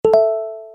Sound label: Tone Notification; BBM